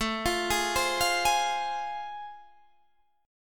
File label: A Minor Major 7th Sharp 5th, Guitar Open D